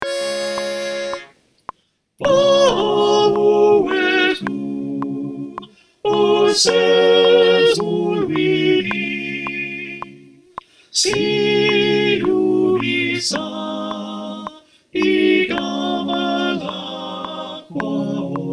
Soprano with click

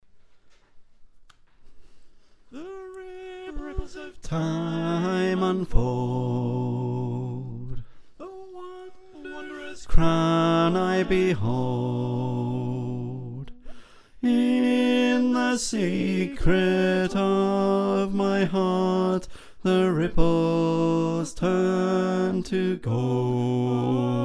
Bass

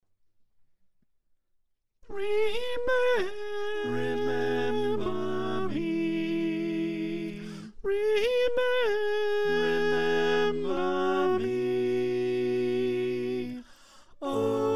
Soprano, choir

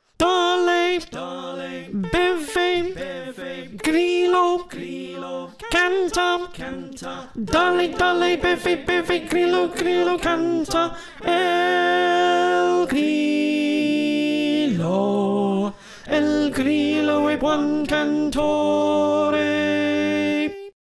Slow Alto

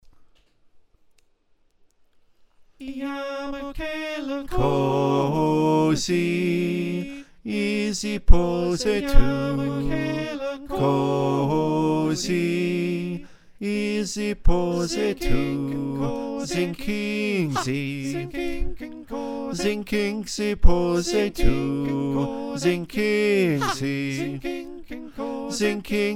Bas; Zincini Nkosi

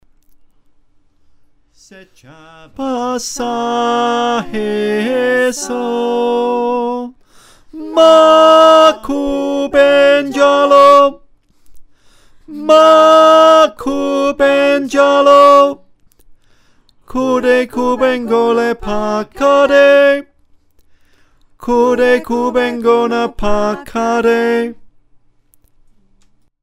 tenor, choir

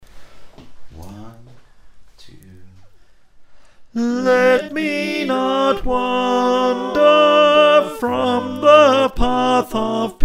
tenor, choir

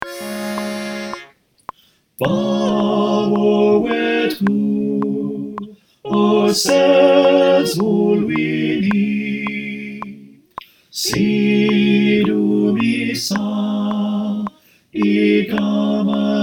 Tenor with click

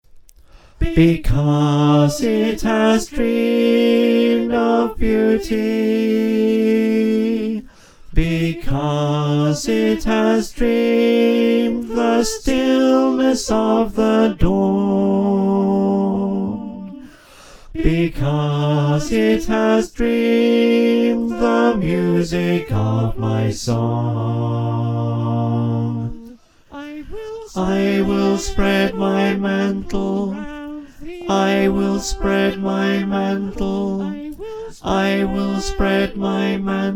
up a tone in F Bas, choir

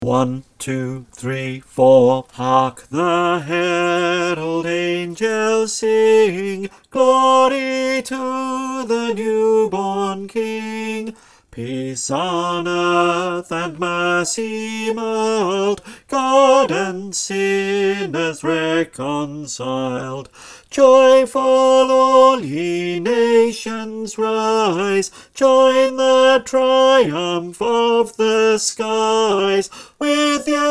unison, choir

